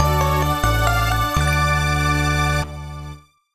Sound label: Fanfare Short; Elebits: The Adventures of Kai & Zero; Nintendo DS